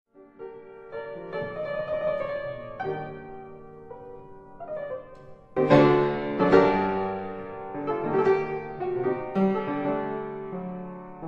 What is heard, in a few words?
Etudes for piano G minor